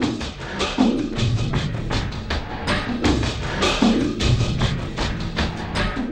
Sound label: Elektro Cym, drums; Sonic Foundry (Sony Creative Software); Friendly Fire